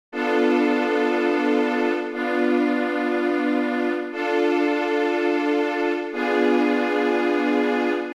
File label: Bm7b5 Bdim7